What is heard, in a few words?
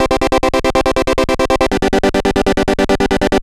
140 Chord G, 140 BPM; loops; CHIPSHOP DELUXE